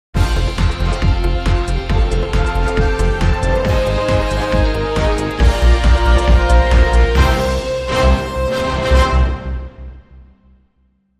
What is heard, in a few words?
Competitive Test, Sting